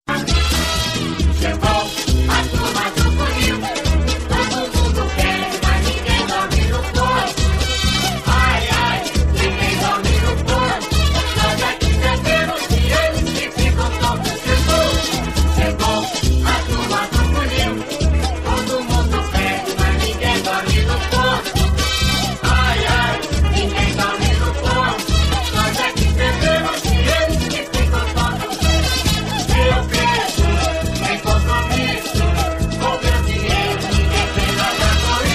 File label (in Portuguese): musicas de carnaval